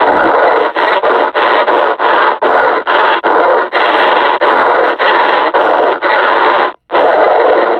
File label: panting, suppressor